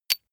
small metal impact medium high double

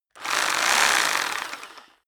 rock drill hammer rev double; soundpool

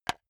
wood board hit; objekte; holz

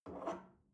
espresso grab from table soft

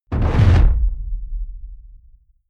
heavy sub noise bass

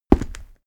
step stone step; schritte

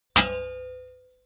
metal impact sword plate; hits explosionen; metall

